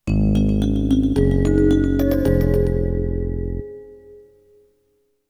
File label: Treasure Chest; Legam; pc-9801